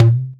Conga; Equipped Music; Loungin House

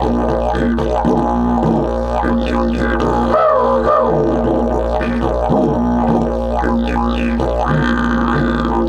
108, DIDGE 108BPM

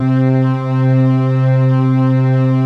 JUNO PADS; Pro-Rec - Synthsonic; JV PADS

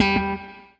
ROCK, Guitar

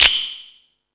Latin Layers, Drum Machines E-MU Pro-Cussion

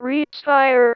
Female vocals and robo-vox

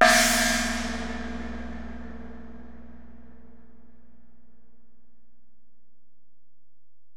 Ride, Alesis DM-PRO

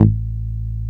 DRAG A1, BASSES

